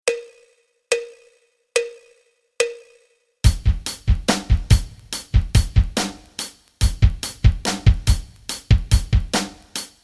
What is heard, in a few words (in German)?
rock rhythmen